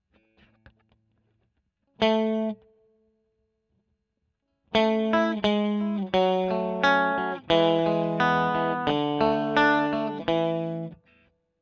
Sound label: E. GTR Royer